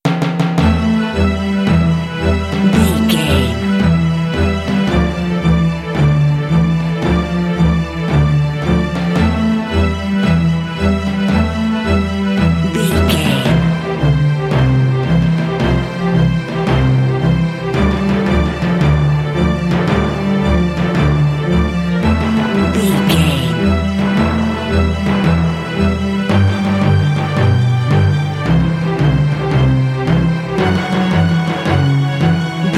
swirling mystery transition

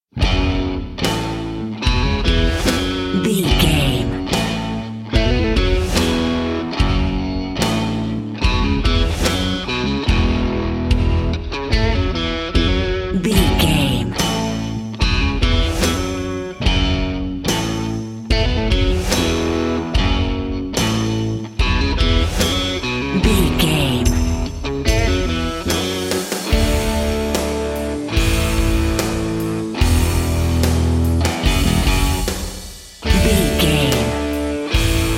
big game rock

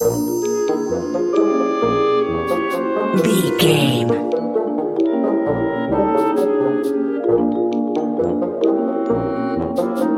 suspicious sneaking

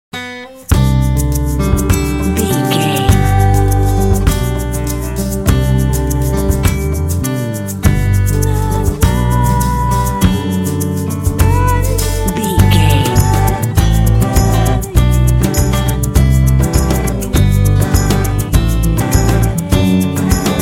warm hearted comfort

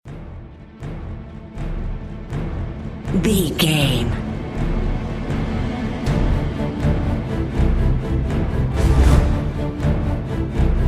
war victory

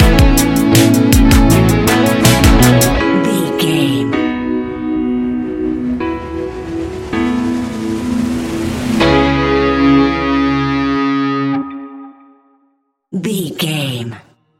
echoes neon

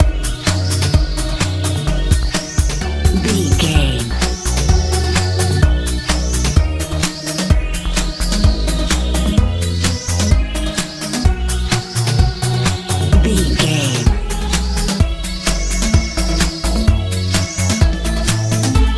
euro dance

